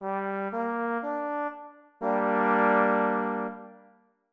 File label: triad minor G3